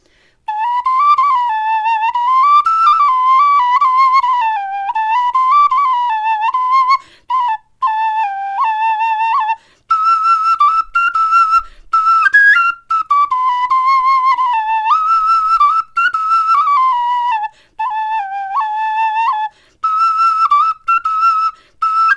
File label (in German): melodie, knochenfloeten